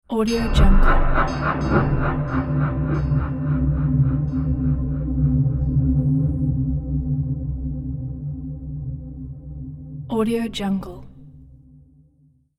dark motives trailer hit

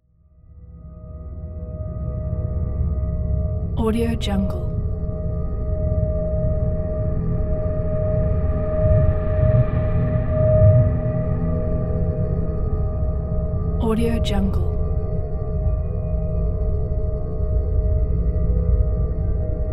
deep space ambient

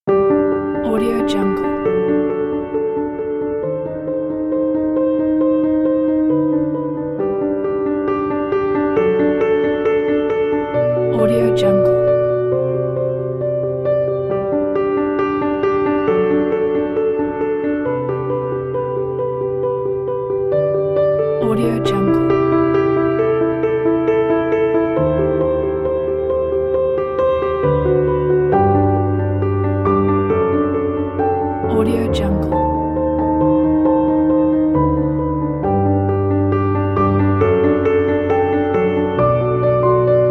cinematic dream; music sx

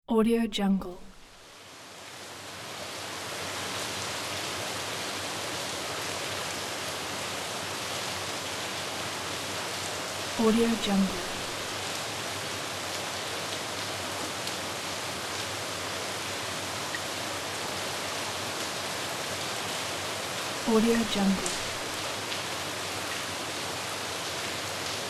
rain light

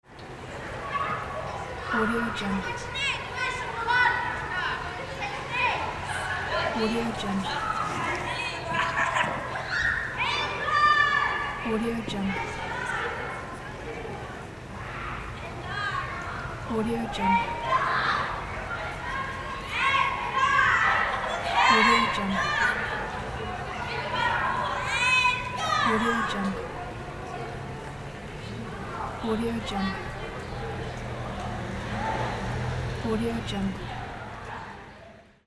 children playing outdoors

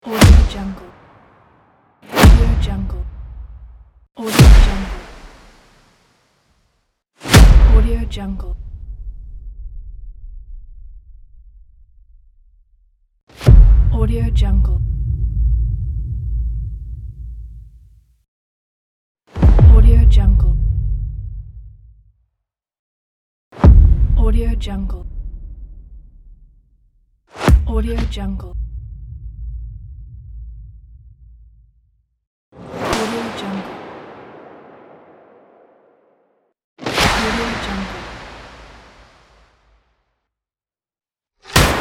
cinematic hits

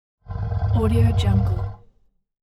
creature beast growl moan; hollywoodedge

